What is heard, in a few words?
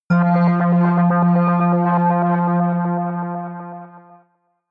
synth hit rhythmic scatter high, hits impacts; whooshes rises